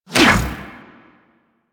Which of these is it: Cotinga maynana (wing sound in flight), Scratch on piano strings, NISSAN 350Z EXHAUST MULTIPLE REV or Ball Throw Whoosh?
Ball Throw Whoosh